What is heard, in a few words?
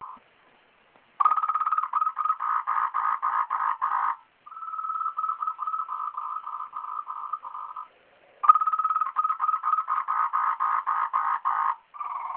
frog